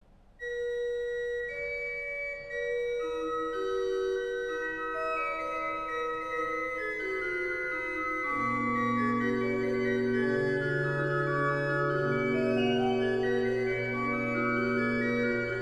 Chorale Prelude; Meine Seele erhebet den Herren

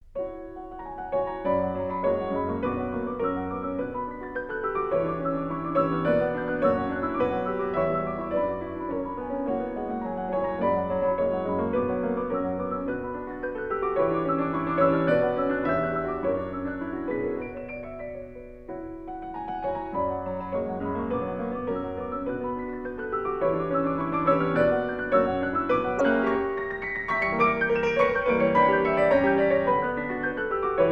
Andantino varié for Piano 4 Hands in B Minor; Variation 3

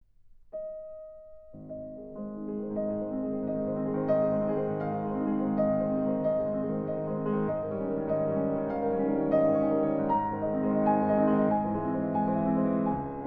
Etudes Op25 No1 in A flat major; Aeolian Harp

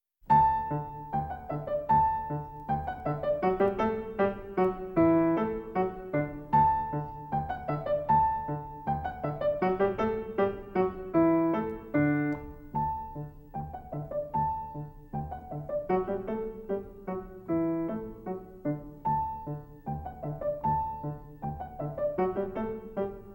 Musette in D Major; BWV Anh 126

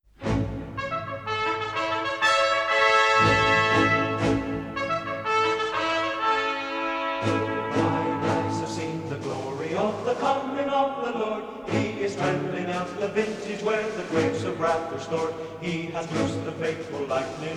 Strings Orchestra Choir

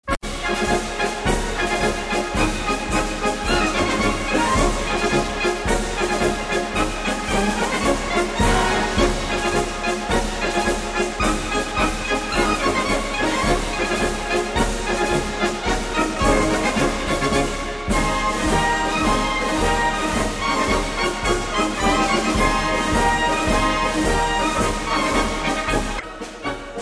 Military Marches; German; Parademarsch des SS Pionier Bataillons

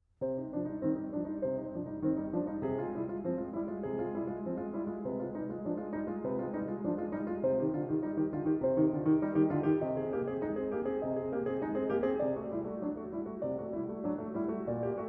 Clavier

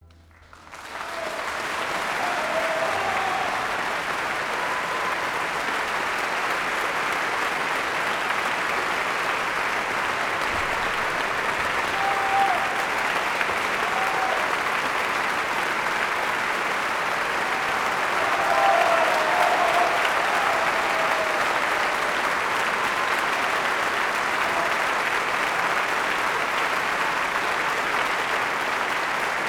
Applause; End Brahms Symphony No4; Celibidache

